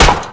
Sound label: attack; dota; brewmaster